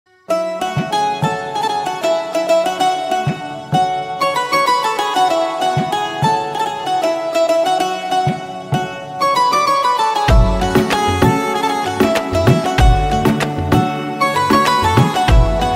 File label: Instrumental Ringtone